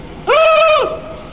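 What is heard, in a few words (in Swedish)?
Tornseglare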